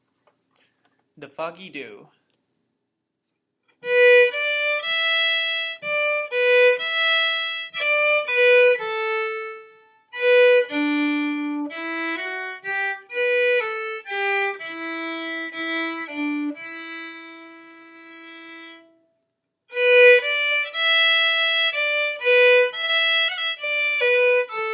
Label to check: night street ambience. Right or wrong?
Wrong